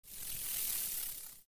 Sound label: Insect wings coleoptera